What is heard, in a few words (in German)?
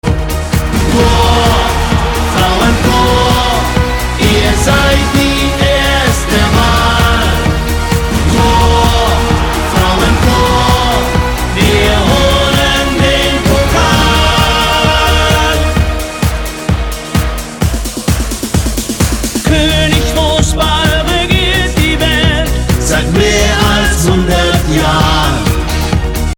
frauenfussball song